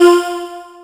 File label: Synths F; Vengeance - Essential Clubsounds Vol.2